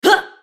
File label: effort; Leona